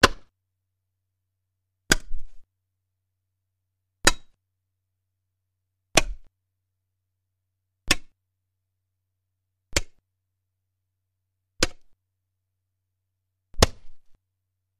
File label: Bone Impacts Large; gore stabs & eat